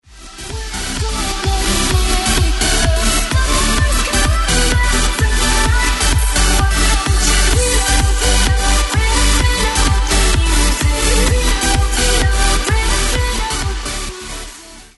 funk; multitrax

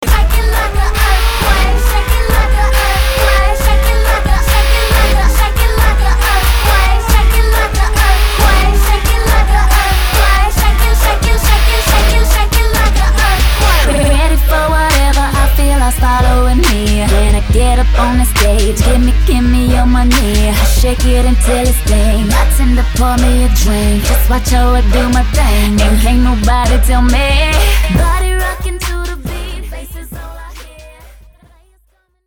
funk; multitrax

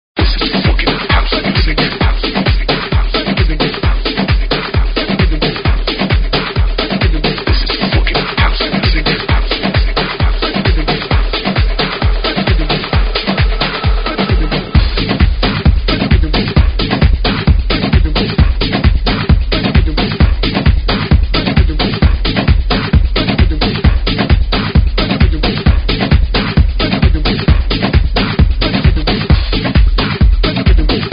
kick; multitrax

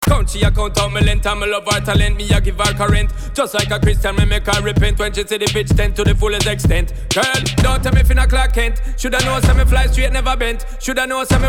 funk hiphop

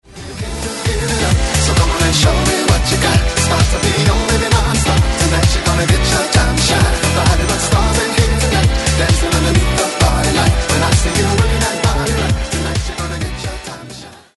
funk rnb hits remixed; multitrax

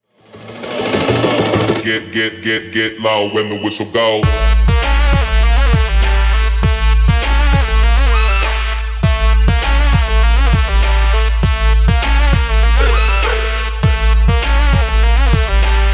funk; powermusic

